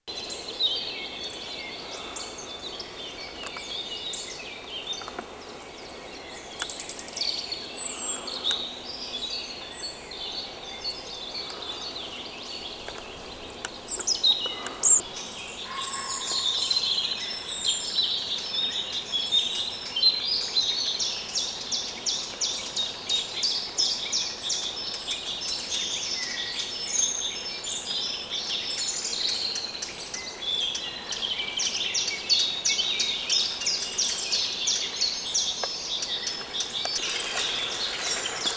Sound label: forest